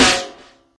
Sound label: Noisy Snare Single Hit C# Key, Royality free snare tuned to the C# note; snare drum samples